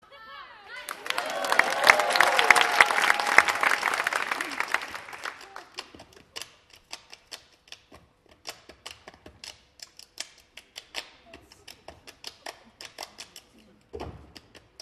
Bodygroove Ensemble Hand Clap affrettando und Applaus; Skit